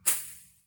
bush destroyed; hits

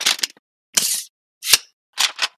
pp19 reload, weapons

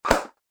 g17 fire, weapons

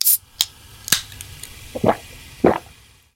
cola; healing

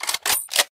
stoner 63 switch, weapons

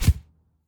sand hit; hits

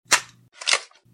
firework launcher reload; weapons

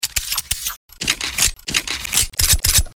dual g19 reload; weapons

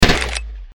model 89 fire; weapons

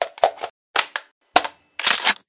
cz600 reload; weapons

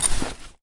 backpack pickup

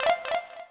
gift ping; pings

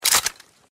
saf 200 switch; weapons

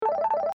deathray switch; weapons

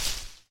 bush hit; hits

